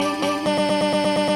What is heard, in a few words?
Behehe Sms